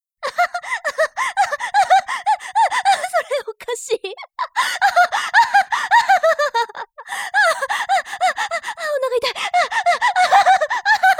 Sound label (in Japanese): warai